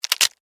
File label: Insect Claw Snap, Insect, Claw, Snap, Movement, Bug; Animals:Insects; Blastwave